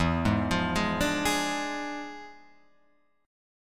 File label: E 7th Flat 9th Guitar Open F, chord; E7b9; arpeggiated